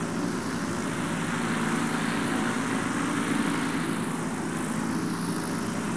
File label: ambient buzzing; SpongeBob Diner Dash